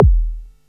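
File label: 400+40HZ S KICK; Best Service Alpha Dance vol.2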